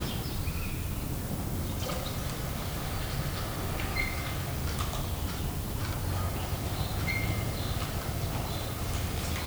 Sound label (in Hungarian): egyhetes tapír, közönséges tapír; debreceni zoo 2012; marantz puska